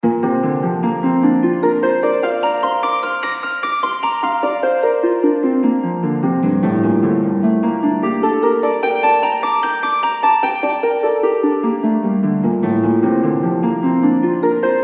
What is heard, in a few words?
Crystal Harp Only